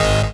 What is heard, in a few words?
SYN TEKNO; Best Service Gigapack 1